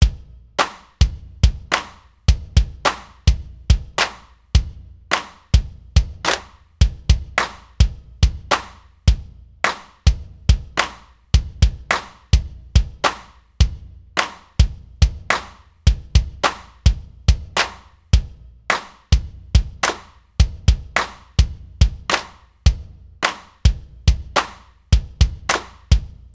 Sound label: Metal Drums